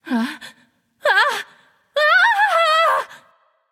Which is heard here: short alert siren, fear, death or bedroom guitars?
fear